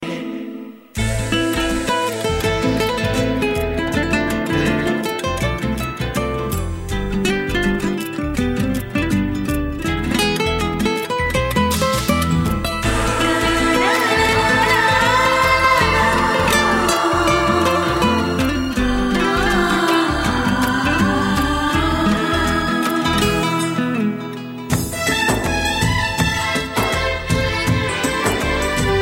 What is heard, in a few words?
romantic soul